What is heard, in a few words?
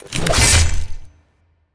archeology event trap spikes; Stellaris; ancient relics